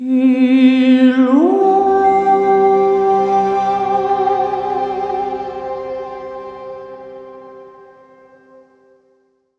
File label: Sting Night to Day; Armello The Bandit Clan